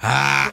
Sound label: "ha" growl; Voice; ooh ahh